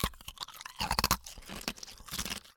Mouthy, chomping, crushing, ice, slush, wet, thick, opening; Eiravaein Sound